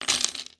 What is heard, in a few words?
coins table